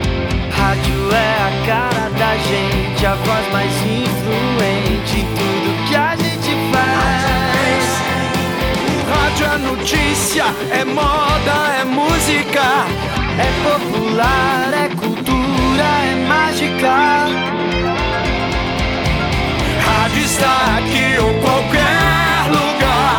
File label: Radio Jingle; 30''; Radio 100